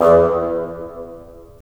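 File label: wind bassoon; LA Composer vol. 3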